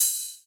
crash tap; osu

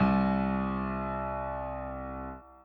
chord in c

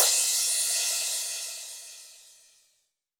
soft hit finish

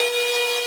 synth main

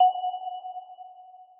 Bell F#6

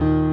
piano low

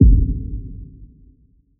stop kick

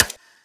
start clap

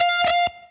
solo 13 16 bend full

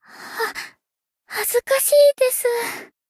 fail sound

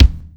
drum hit whistle; osu

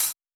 Hi Hat Normal - R

